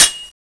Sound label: m249 clip out, weapons